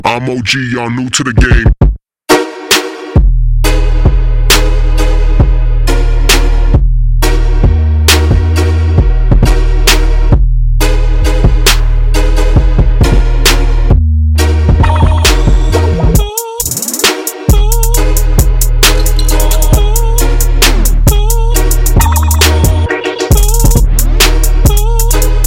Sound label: Trap Bass